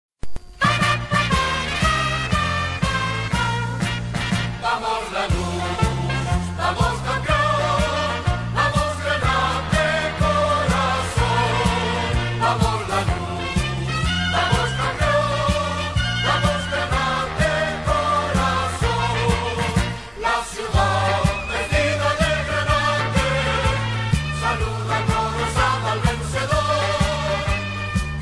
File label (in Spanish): marcha